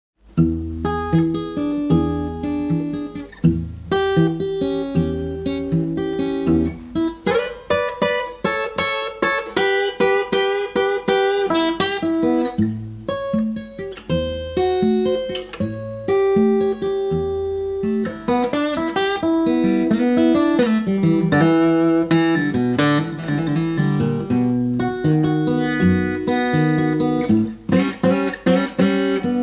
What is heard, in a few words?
Blues; Blues Book 2